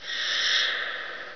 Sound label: errie